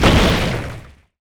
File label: Meteor Hit; SE Ing Other Rule#03 (SE Bigbang02 Meteor Hit)